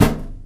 metal computer impact soft; physics; Garry's Mod; hl2

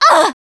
hurt major, russian, Survivor Voice Producer

